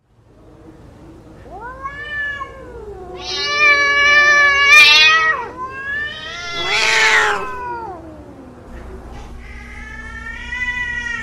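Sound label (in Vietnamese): tiếng mèo ghét tiếng con mèo sợ phát điên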